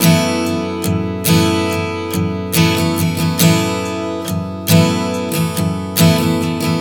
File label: Strum 070 F#m, One Chord Strumming 070 bpm; Sonic Foundry (Sony Creative Software); Songwriter Acoustic Guitar Companion